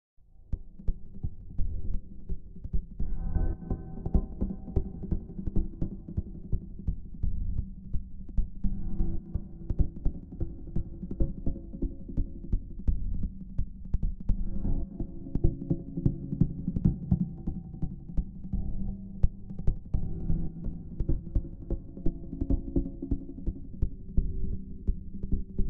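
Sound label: percussion loops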